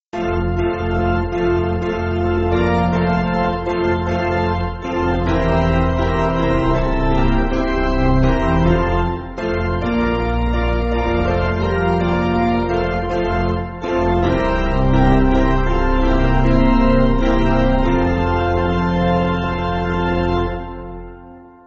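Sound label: Piano, Backing Files for Churches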